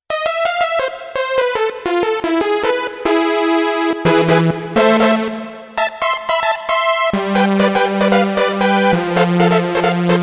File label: brass